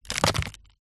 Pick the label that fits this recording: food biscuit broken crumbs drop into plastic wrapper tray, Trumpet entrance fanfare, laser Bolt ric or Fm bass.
food biscuit broken crumbs drop into plastic wrapper tray